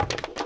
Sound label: wood movement; Sid Meier's Civilization 4 Complete; Warlords; Units